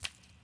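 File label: wet run, footsteps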